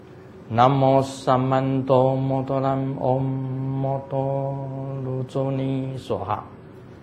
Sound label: Buddha Locana Mantra